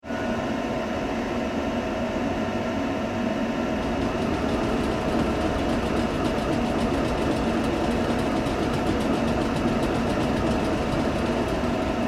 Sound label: Train Electric in Station then Departs; Ticking over in station then carriages past camera